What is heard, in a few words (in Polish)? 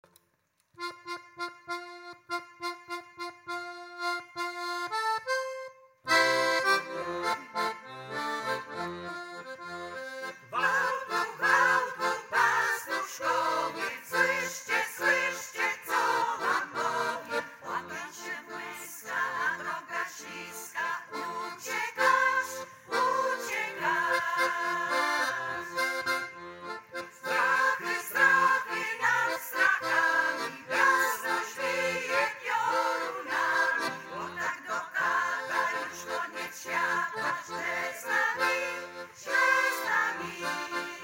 Zespół Wokalno instrumentalny